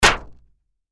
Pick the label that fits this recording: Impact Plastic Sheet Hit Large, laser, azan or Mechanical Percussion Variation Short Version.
Impact Plastic Sheet Hit Large